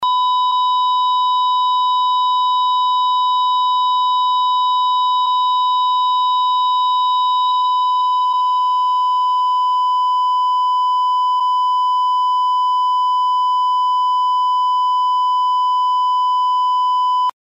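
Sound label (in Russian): zvuk kardiomonitora kogda serdce ostanovilos, cardiac monitor sound when the heart stopped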